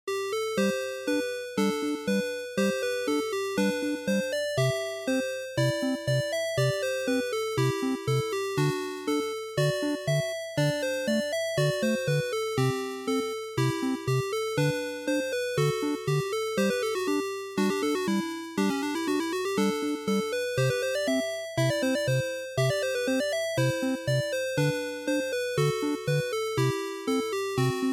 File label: NES General Title